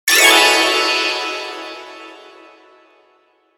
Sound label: Pixie Dust Shimmer Twinkle; Magic; 5.1 STEREO MIX; Blastwave